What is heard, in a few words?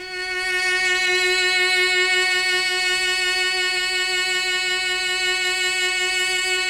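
chamber cello; Sonic Reality; Vintage Timetraveler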